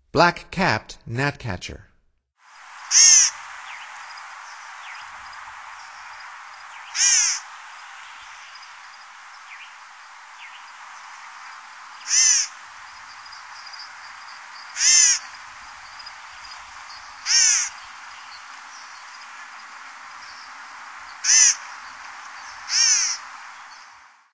Black-capped Gnatcatcher, Songs of Birds of North America; Stokes